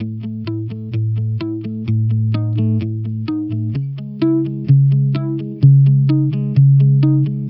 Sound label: Electric Pop Guitar 128BPM C#min; Vengeance - Essential Clubsounds Vol.4